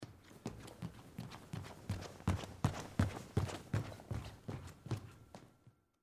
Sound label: Stage Floor Run, Footsteps, Stage, Floor, Run, Across, Wood, Surface, Theatre; BlastWave